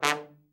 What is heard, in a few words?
trombone, Killer Horns; Best Service